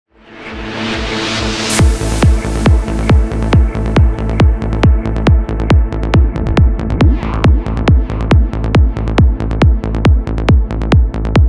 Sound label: kick; vern rocketship